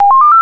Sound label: Pickup, Signalling Tones Elevator Phone